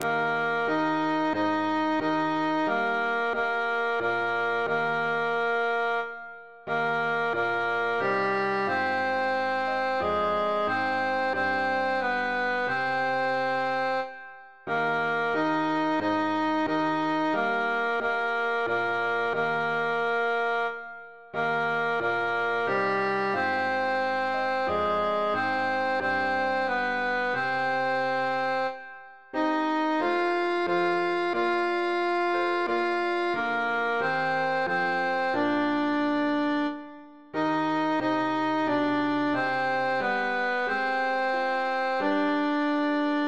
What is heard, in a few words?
tenor